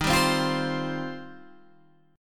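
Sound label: E flat 6th Add 9th E flat, down, Guitar, Open G; strummed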